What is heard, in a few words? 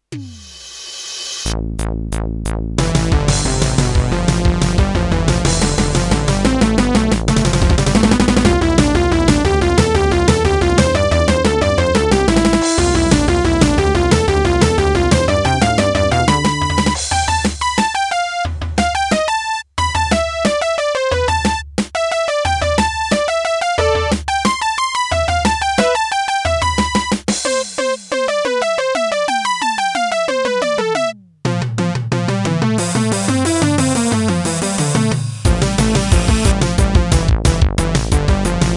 battle